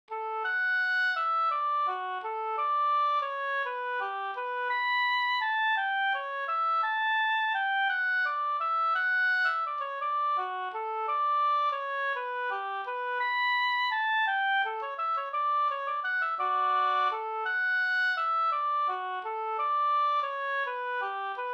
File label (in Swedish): vals